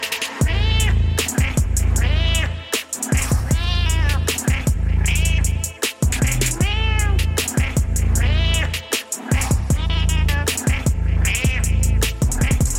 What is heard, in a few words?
cat rap